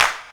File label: clap, House Oneshots; E Lab; XTc Files of House